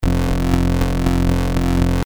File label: dpcm